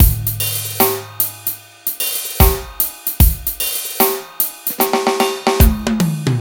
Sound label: Clear Drums